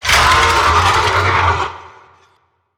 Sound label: Necramech Melee Idle; Battle Barks